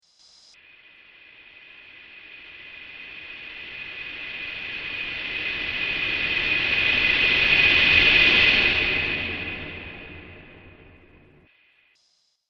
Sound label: Haunting Wave Transition